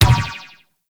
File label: Ball Bounce Default; Warframe; Missions; Tenno Ball